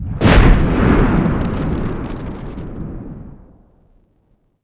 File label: FIR DIE